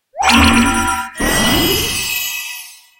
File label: gear gacha ball